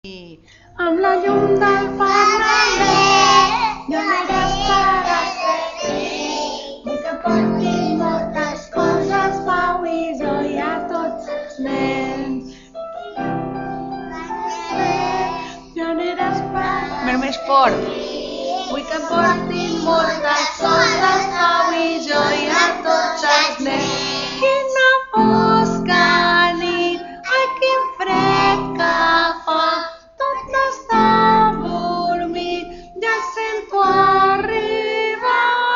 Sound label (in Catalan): fanalet cantada